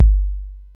subtle reverb kick g key, bass drum sound tuned to the G note; kick drum samples